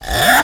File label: Short Fills & Reverse; Vengeance Samples